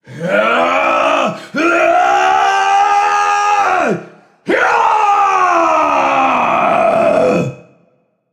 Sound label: battlecry